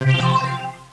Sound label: Menu; Sonic Parallactic Adventure